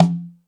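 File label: Jungle Percussion, Drum Machines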